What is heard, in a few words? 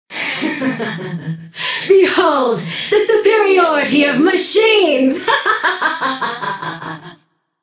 yay; smite; voicepack; persephone